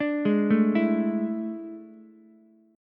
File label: A Major 7th Suspended 4th, Ukulele; arpeggiated